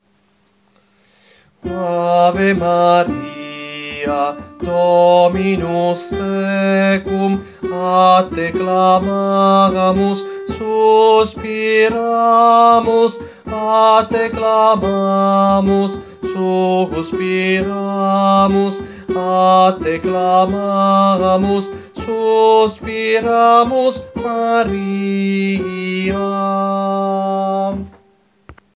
Tenori e Bassi, Coro; Coro Isola del Giglio; Ave Maris Stella; Rota

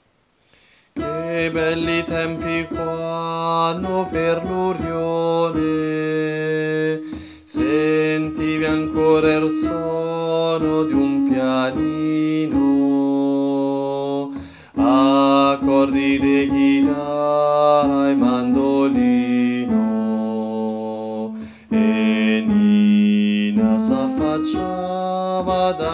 soprani; Coro Isola del Giglio

